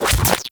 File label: Beam Impact Generic; Warframe